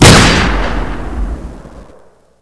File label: sound aug; cstrike; ZP CRISTIAN; ZP WEAPONS